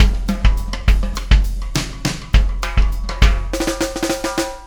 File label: Fill, Loops; Sonic Foundry; Not Just Another Pretty Bass; AI Loops - Siggi Baldursson